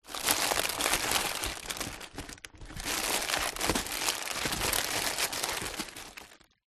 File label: food potato chips packets movement in card box